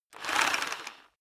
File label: rock drill hammer rev short; maschinen; bohrer; soundpool